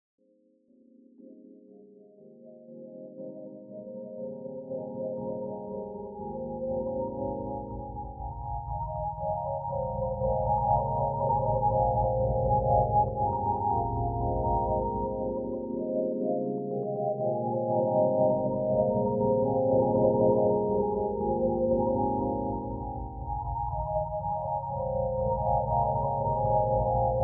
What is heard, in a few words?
rule 90 sonification